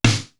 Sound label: HOUSE SNARE; East West; Global Sounds